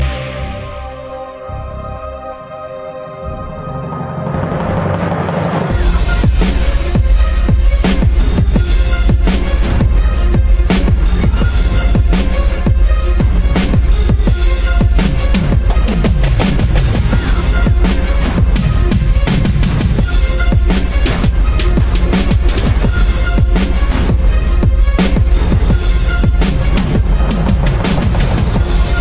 violin hip hop

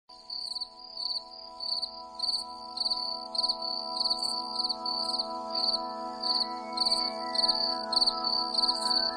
crickets grilli; animals

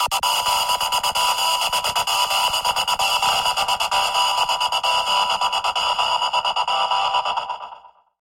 Dance Arpeggio

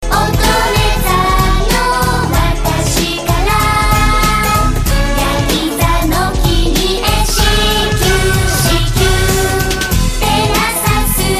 horoscope cute

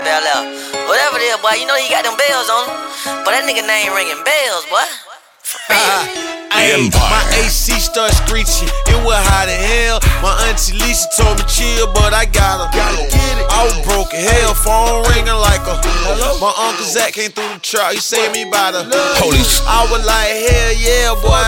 bells, hip hop ringtone

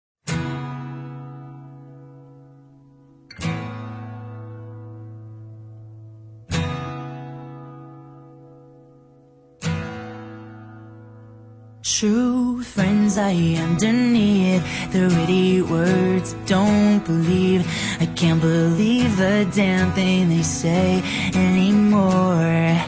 acoustic version

